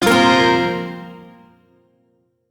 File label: Shawzin Blues Chord; Warframe; Duviri